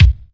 thumpy bass drum one shot b key; tuned drums

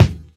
short steel kick drum sample e key; tuned drums e key

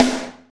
acoustic snare sample c key

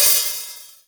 trebly open hi hat sound c sharp key

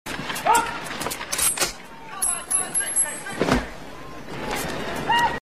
reloading rifle and dog bark

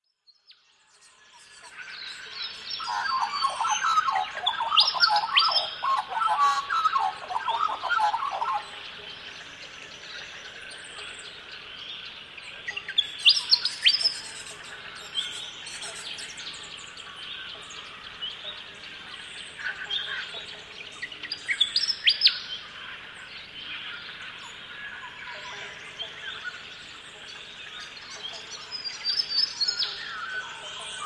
spotted nightingale thrush song

